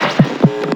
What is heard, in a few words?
Radio Static Start; Night Wave